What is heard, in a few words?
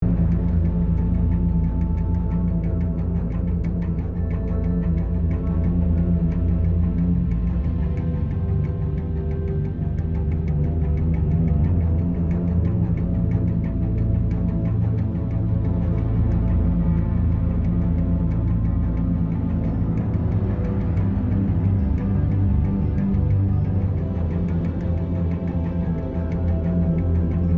Tense (Loop)